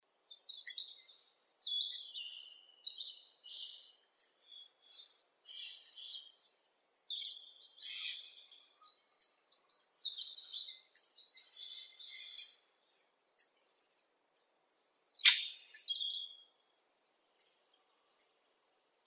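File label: hawfinch, Coccothraustes coccothraustes; dubonos obiknovennii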